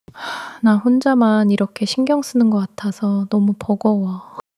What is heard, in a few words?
sulk long sulk